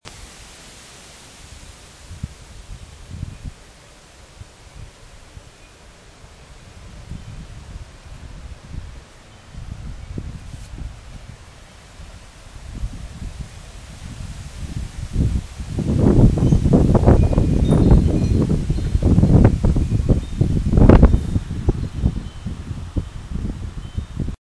whooooosh please mr wind spare our humble garden

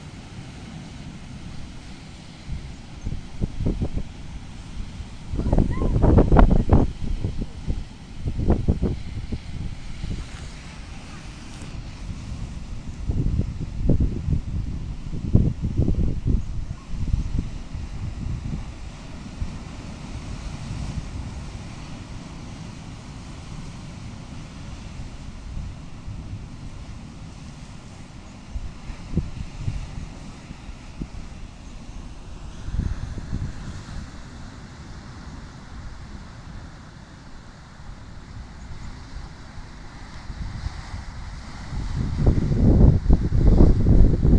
crashing waves st ives

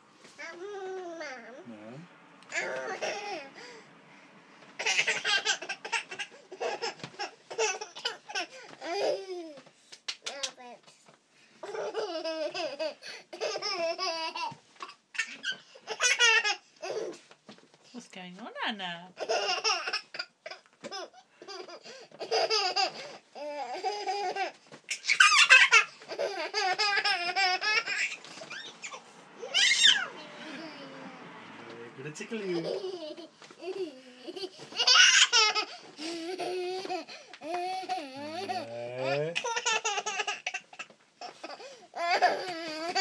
laughing girl

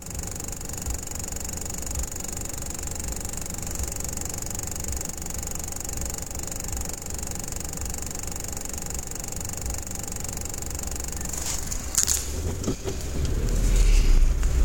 weird sound in car; in dads car

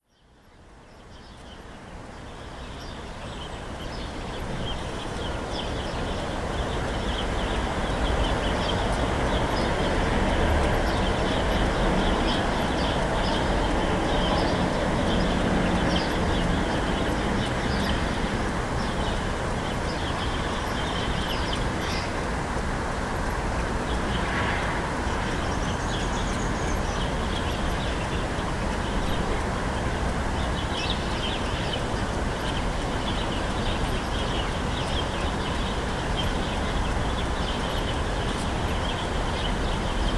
urban birdsong dawn in swansea uk

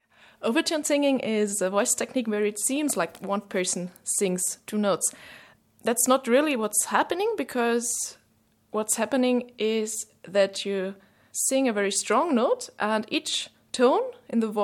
overtone singing

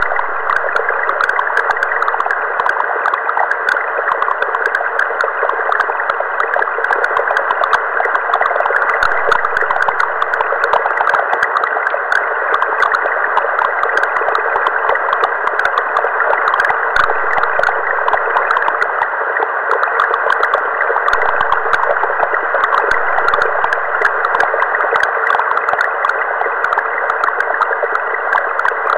milstar milsat fhss waterdripper downlink

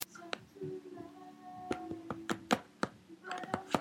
ambient riverboat

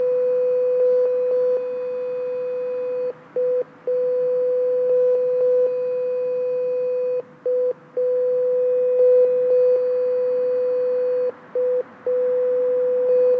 weird beepy barriers; mcr uni

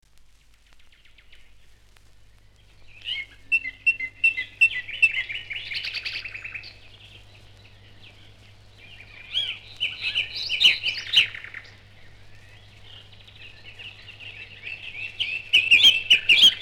birds dawn chorus; HMV Birds SFX

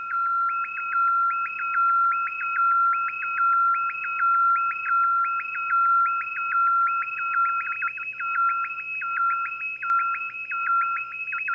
sine cyclotron delay

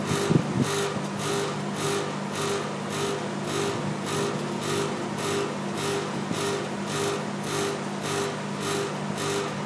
pub; pubdrone

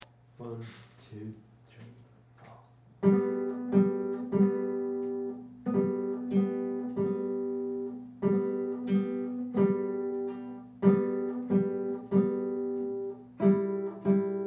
jingle bells

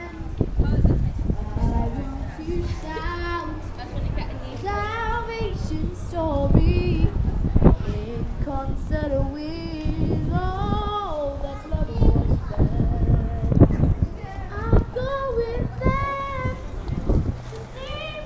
street singer

